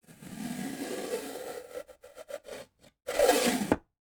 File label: wood door viewing slide speakeasy open close; TheLibrarybyEmptySea; Gateway